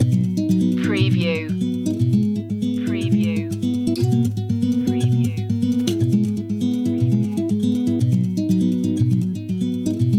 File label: together we go loop